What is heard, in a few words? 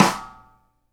SIDE STICK, Live Snares; PlatinumPhattCD1; E-MU Producer Series Vol. 8 – Platinum Phatt